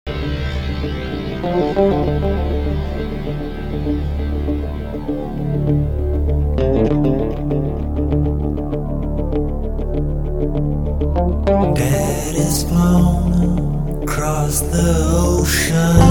delay

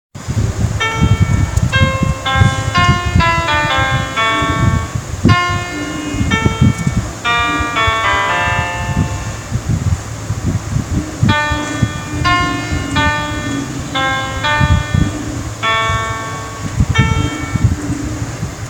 old classical song

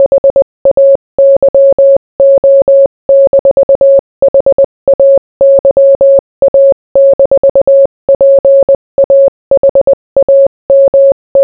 morse

